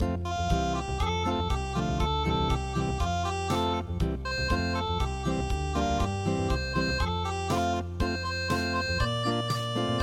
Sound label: unpluged, midi